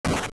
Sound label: Shatter, A breakable dungeon block or overworld boulder shattered; AOL